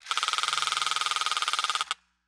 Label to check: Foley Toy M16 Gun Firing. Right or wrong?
Right